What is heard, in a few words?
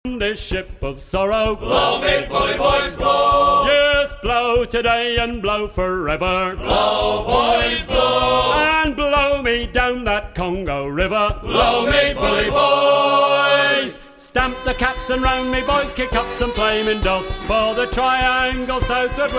congo african tink